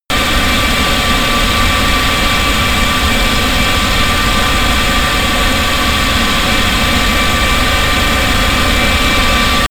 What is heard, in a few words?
plane airbus a320 turbine full power close